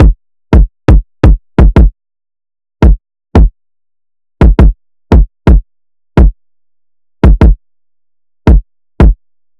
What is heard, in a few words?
Drum Loop - 170 BPM Kick; Cymatics; Anthem Drum Loop